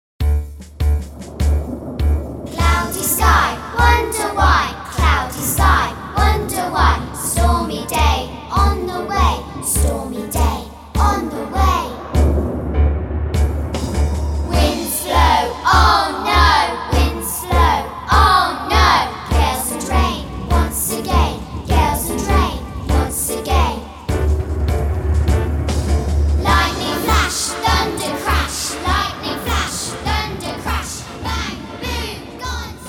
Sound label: Stormy Chant